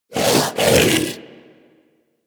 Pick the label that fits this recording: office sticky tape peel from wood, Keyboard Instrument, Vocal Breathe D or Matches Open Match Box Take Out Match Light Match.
Vocal Breathe D